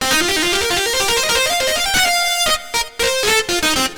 Riff Up In C, Keyboards

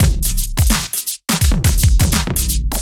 Duo Swing Mix, 85bpm; off the grid

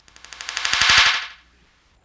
Dub Noise, SFX, Electronic; Sonic Foundry (Sony Creative Software); Electronic Point Blank

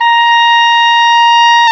oboe, Oboe Brth

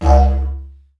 Didgeridoo Kit Samples

